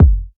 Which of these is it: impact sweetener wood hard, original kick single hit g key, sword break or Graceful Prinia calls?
original kick single hit g key